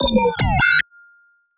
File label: additive one shot